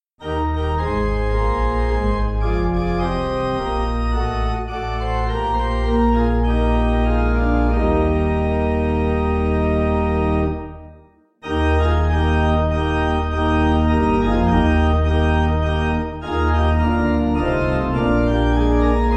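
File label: pipe organ, hymn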